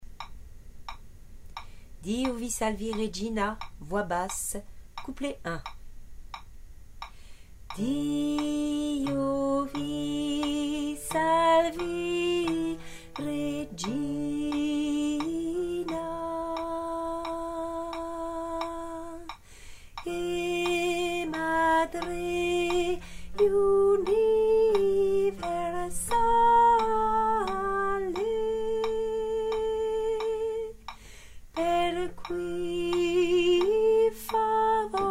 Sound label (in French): basse